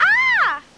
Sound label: "ah" surprise, Voice; ooh ahh